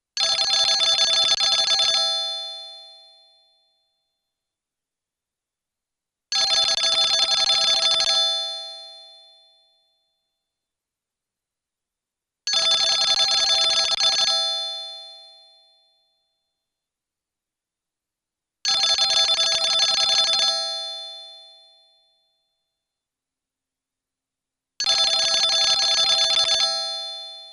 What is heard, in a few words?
Old Swiss Phone, ringtones; old phones